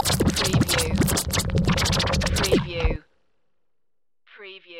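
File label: Scratch Fast 100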